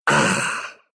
pain, ru; dota2

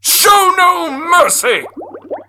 Attack; Awesomenauts

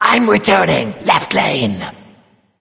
laughing; Smite; Laughing Skull Bakasura

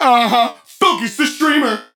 Taking Damage; BombKing; Paladins

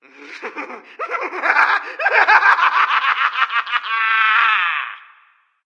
laugh; SamurAI Susano; Smite

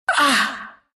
pain, ru

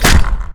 projectile impact; Huskar

